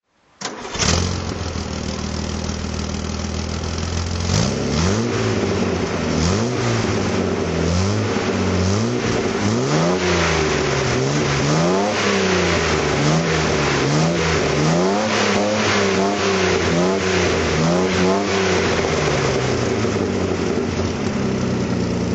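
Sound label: austin healey throttle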